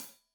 Hat Loose, Hi-Hat, Cymbals Stereo

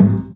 Loop, Chamberlin Rhythmate

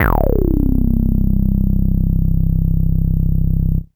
C1, Synth Hits; Zero-G; Soundclash Nu Dance Electro; Acidized

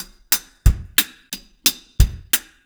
RG90 DRUM, REGGAE; Best Service Advanced Media Trax Modern Composer

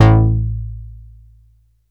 Bass A1, Prophet Buzz Bass; Oldies

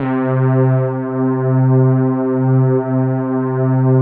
PAD, DIGI-PADS

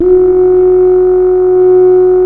BEGIN LOOP, Synth and Organ; InVision Interactive; Keith Emerson; The Most Dangerous Synth and Organ

